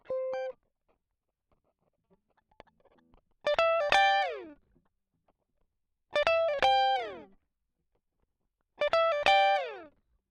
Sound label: Guit Solo Line, RNR for piano